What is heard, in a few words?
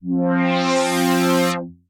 slow seque pad; Synth Pad; Ableton; Creative Extensions; Poli